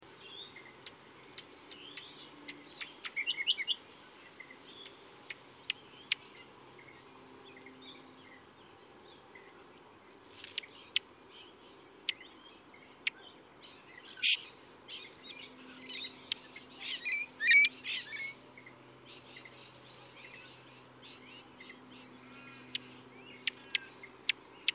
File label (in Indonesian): kecial kombo